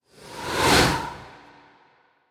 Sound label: Whoosh Breath By Passing Ghostly; Hollywood Edge; sound designer's toolkit v2